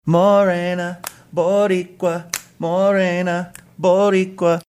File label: singing